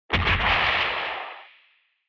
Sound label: Retro Anime Robot Low Short